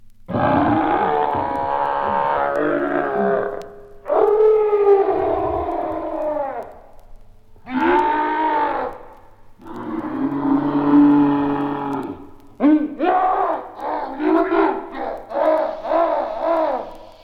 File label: mad gorilla scary